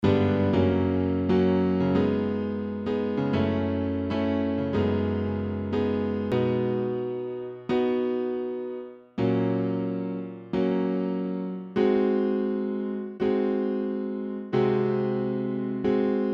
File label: Still I Will Love Instrumental